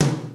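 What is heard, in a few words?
low tom; MPC-60 DRUM2; Greytsounds VOL-1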